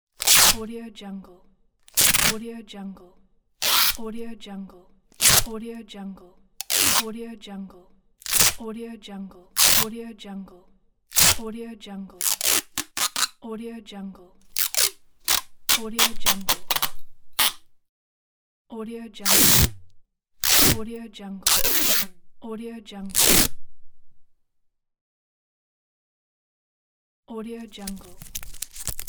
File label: sticky tape; davide martini